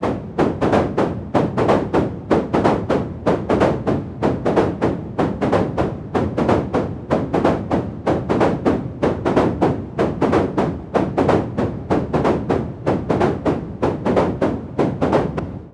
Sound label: Deep 125bpm